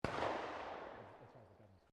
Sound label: SHOTGUN 12 GAUGE FIRING DISTANT, Sporting Shotguns